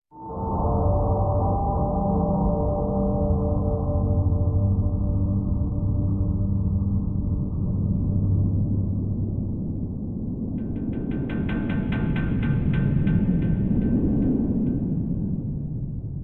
Amb Outdoor; The Hobbit; PS2

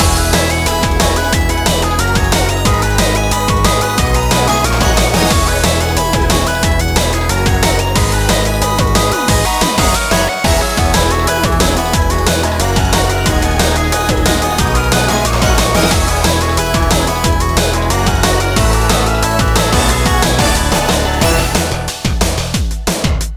Water World Stage Loop; stardust galaxy warriors stellar climax

